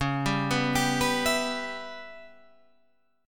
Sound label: Db Minor 7th Flat 5th Db down Guitar Half Step Down